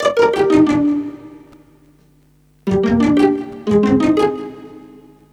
D string plukz

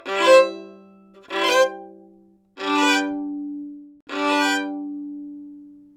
Violin Bowed

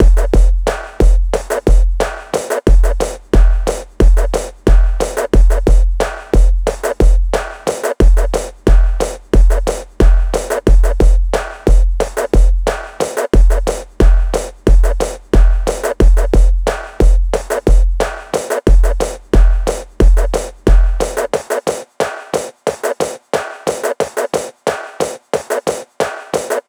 Fruity Tech Trance whole drums only

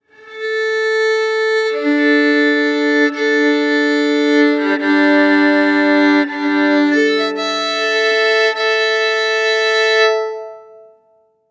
Violin tune; BLASTWAVEFX

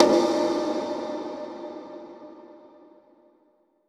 Musical accent tribal bongo hit dark; SFXBible

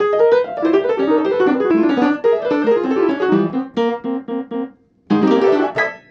Piano Improvised Classical Free Form

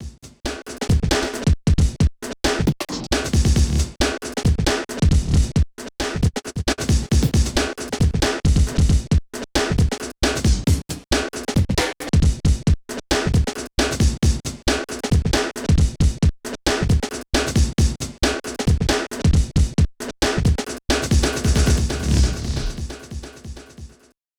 135 drumnbass

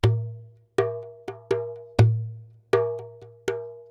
Jembe

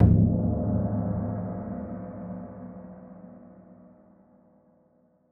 Gong hit loop

